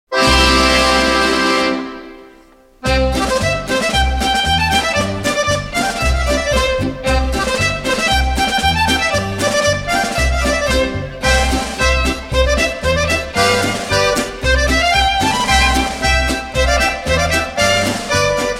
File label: lancashire barn dance instrumental